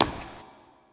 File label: foot tile echo; Zomboid 41.78.16; Project Zomboid